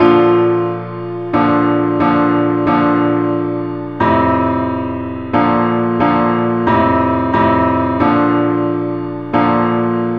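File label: simple acc key B flat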